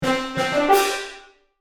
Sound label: Marching brass and field drum accent